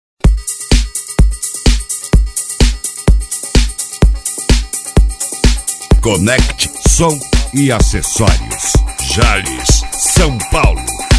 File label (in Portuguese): mega funk